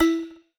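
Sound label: default keypad button sound; netent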